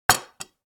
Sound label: Plate Put Down